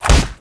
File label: hit punch